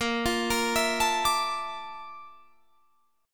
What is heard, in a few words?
A sharp Major Sharp 11th